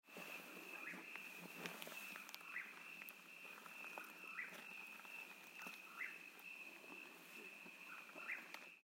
Mexican Whip-poor-will

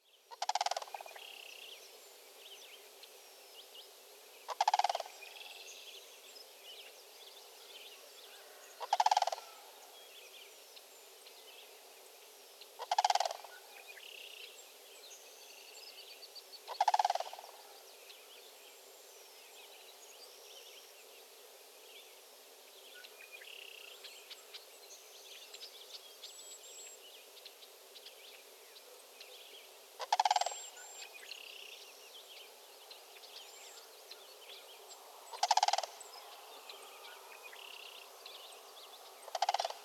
Sandhill Crane pair 9 calls

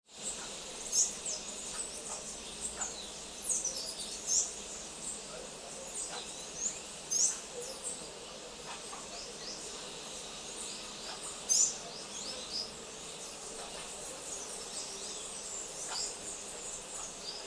White-chested Emerald

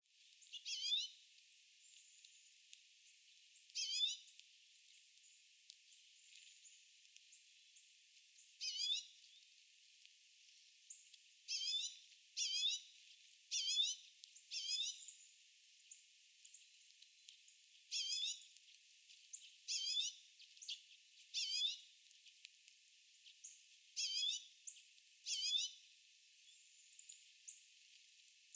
Common Redpoll

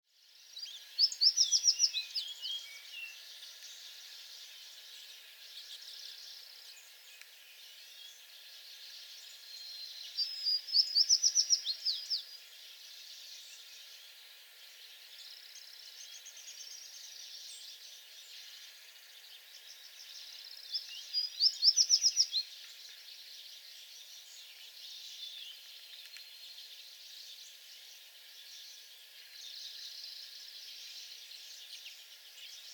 American Tree Sparrow songs

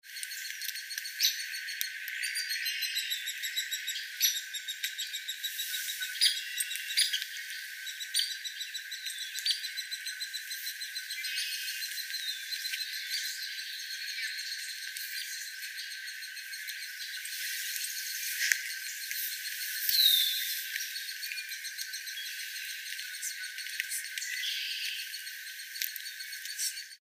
Hairy Woodpecker Nest

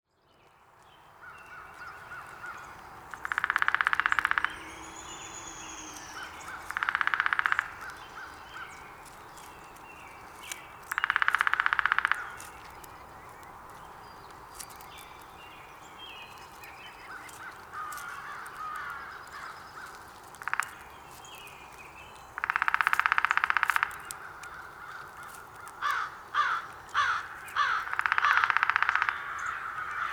Downy Woodpecker Drums

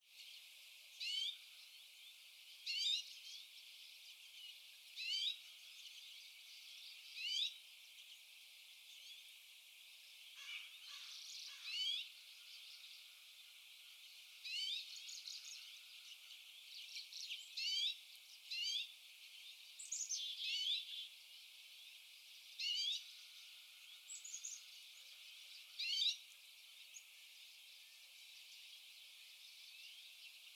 Common Redpoll Rising Call Notes

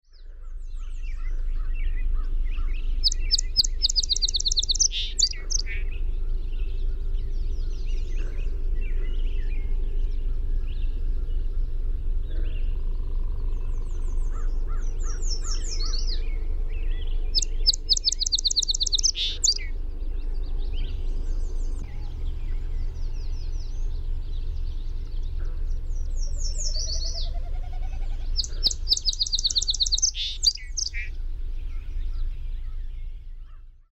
Song Sparrow

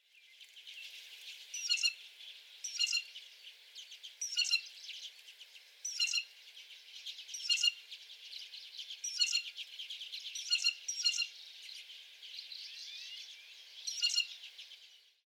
Blue Jay Pump Sound

